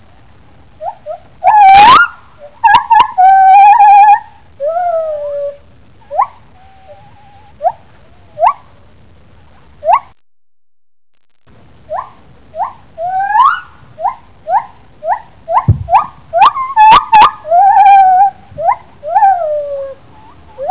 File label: Male, gibbons; lar